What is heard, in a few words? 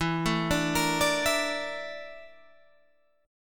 Bb Major 7th Flat 5th